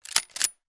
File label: weapon gun; charles daly; still north media